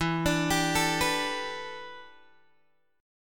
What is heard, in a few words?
E Minor 11th guitar chord, Open G